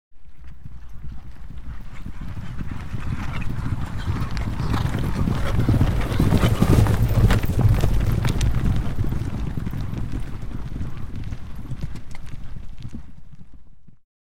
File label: 10 Horses Canter By; Historical; Hollywood Edge Historical Series; War Horses Armies & Weapons